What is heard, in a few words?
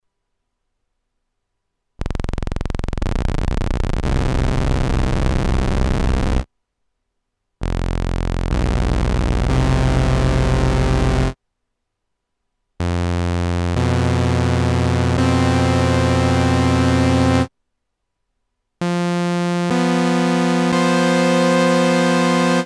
Korg Volca Keys Poly Poly Osc Test